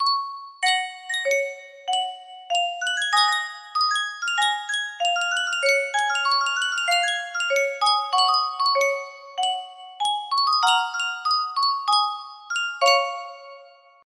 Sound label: Sankyo music box, music box melody